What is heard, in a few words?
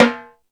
Latin Drums, E-MU Pro-Cussion; drum machine